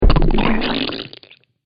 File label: Big Muddy Splat, slimy